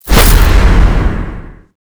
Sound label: jump